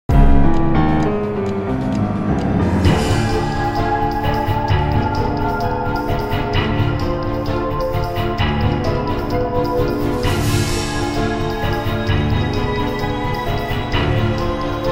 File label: Mansion Fast Amb; music; The Da Vinci Code; XBOX